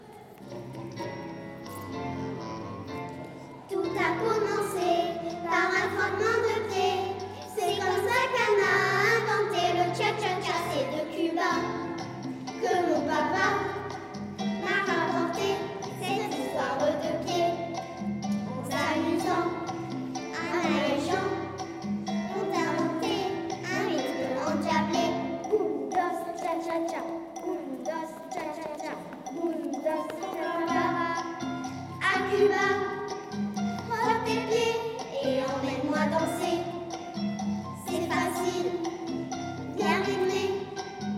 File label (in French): Chorale Enf